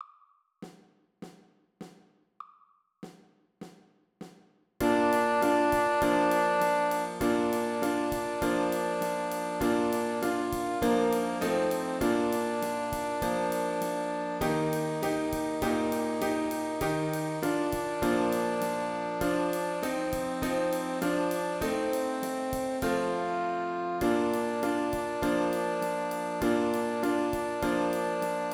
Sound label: Jingle Bells Sax